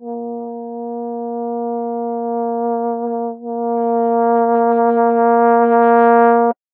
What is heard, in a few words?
Long Note, Trombone Extended Technique